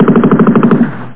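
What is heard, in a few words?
machine gun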